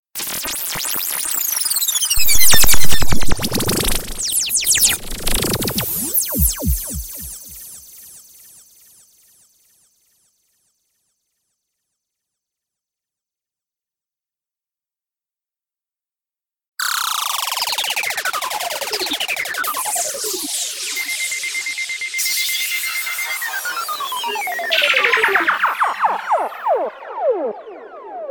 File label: sound effects pack